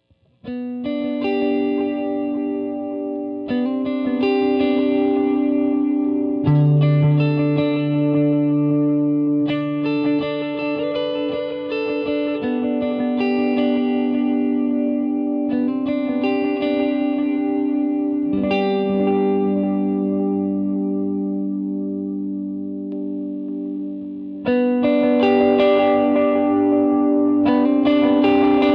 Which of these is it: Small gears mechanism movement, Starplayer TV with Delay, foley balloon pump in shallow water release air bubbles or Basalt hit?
Starplayer TV with Delay